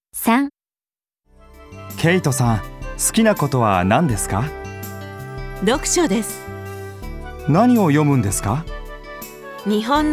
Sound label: sang, vocal